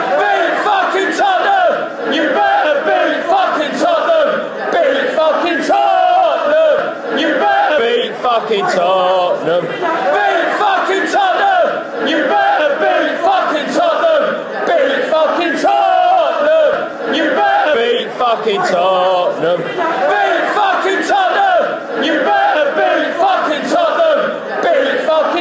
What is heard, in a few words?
beat fcking tottenham, fanchants